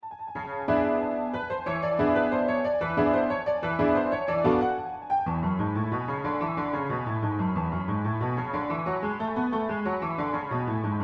Tre frammenti per pianoforte; 1802 non oltre lestate

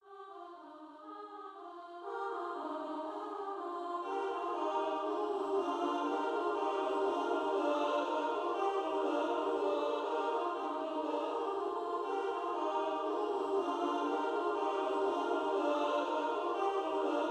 Toast per quatto voci; Wartensee Schnyder von

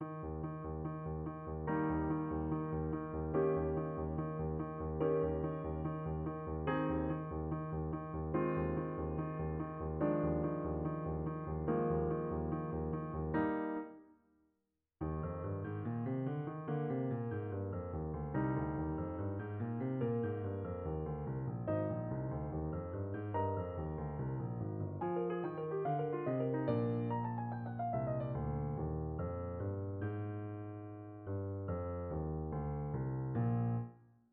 Sonata per pianoforte; abbozzi originali

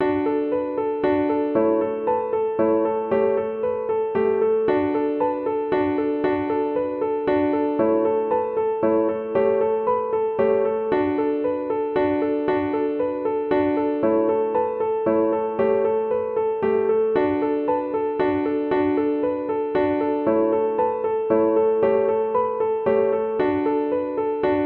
Danza in re piano

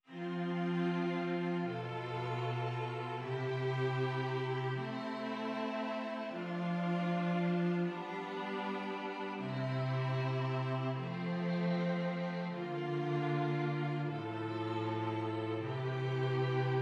esercizi di contrappunto semplice A tre voci

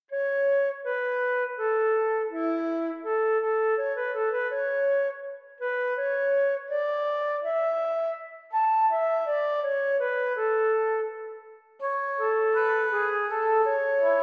Canone a tre voci in la maggiore

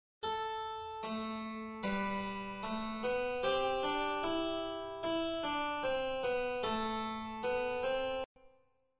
Rivolto alla duodecima o quinta superiore

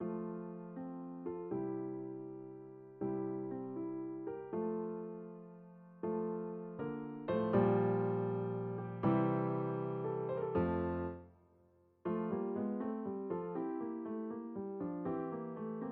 per pianoforte; Sechter Simon; Thema de Beethoven

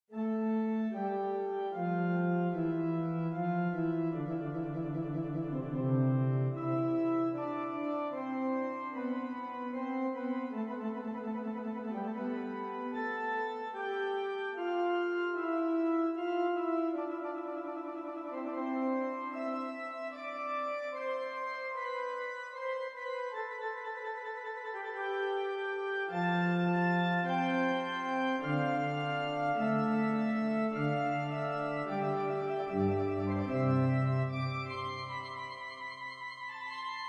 Fuga antique in do maggiore; principio 1803

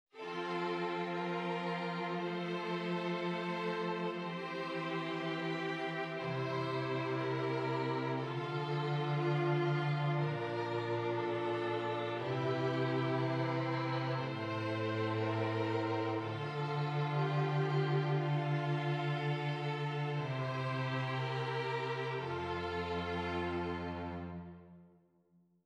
esercizi di contrappunto semplice A quattro voci

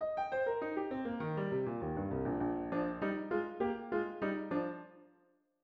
Appunto per pianoforte

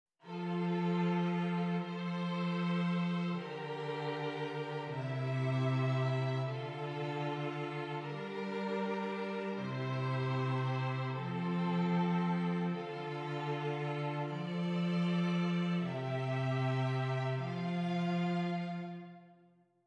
esercizi di contrappunto semplice A tre voci

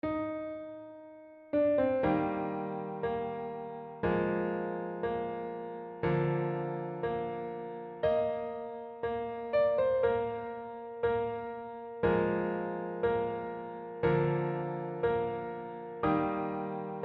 Adagio; Kerman

